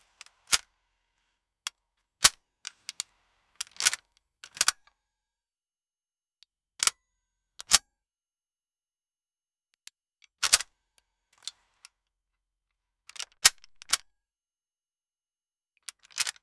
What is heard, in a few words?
weapon reloading; guns